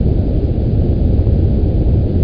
jet

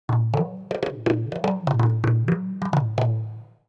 PERC LOOP ETHNO 125; eJay

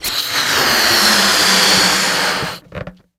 blow; BESTSHOT; noisybit

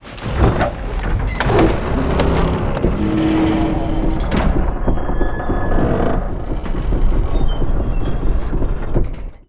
gate open

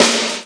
snare; CLARITY

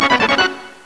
Fan Fare; 3D Ultra Pinball Demo; Pinball Demo Music

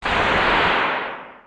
JET

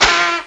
Honk

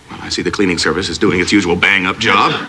CLEANING; Millennium Gold 2000

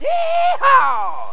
"YEEEEHAWW!"

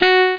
saxophone

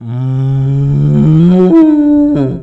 moo

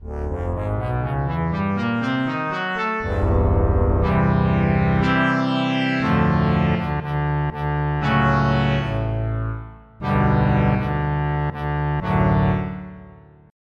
Omniverb Flange, synth

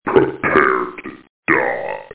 die; beerwar

